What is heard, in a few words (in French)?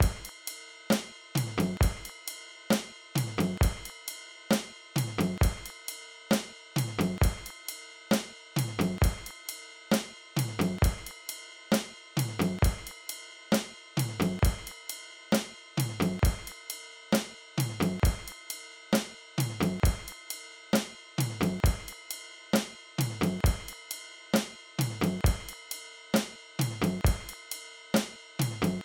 Drums, Pattern de Drums; Cantal